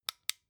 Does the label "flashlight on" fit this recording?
Yes